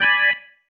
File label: Stab One Shots, Organ